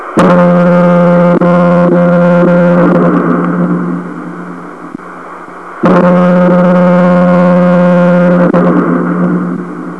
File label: foghorn